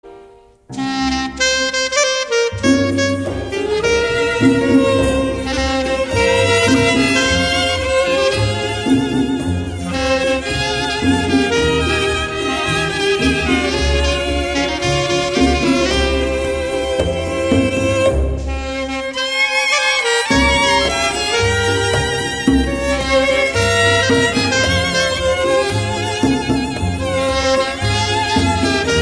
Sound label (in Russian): medlennaya muzika